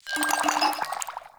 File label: Vial Foley C; Warframe; Lasrian; Lasrian Soldiers; Footsteps; Lasrian Goonadier